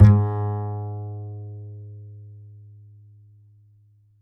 D, Upright Acoustic Bass

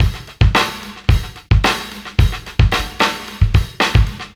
live beat; beats; Sonic Foundry (Sony Creative Software); Ugly Remnants VOL-2

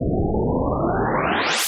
Sci-Fi, Classic SFX, cartoon

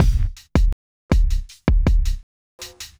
Smooth, R&B Drums

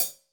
HH, Drums; Bob Clearmountain Drums I

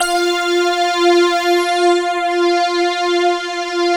SYN, analog

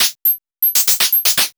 POLYMOD; AMG - Vince Clarke Lucky Bastard

